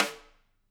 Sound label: room Rim, drum